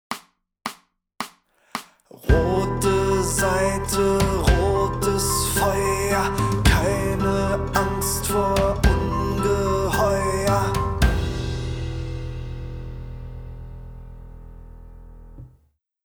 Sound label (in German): Rote Saite, Gitarrenschule; Titel 3 – Rote Saite; die bunte gitarrenschule band 1